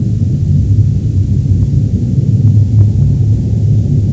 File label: amb engine far; cruisecontrol